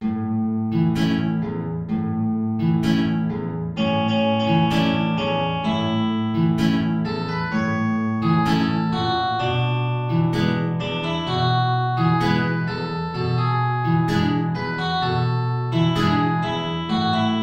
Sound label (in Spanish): tango